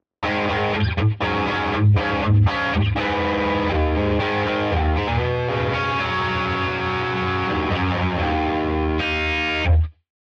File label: StereoDelay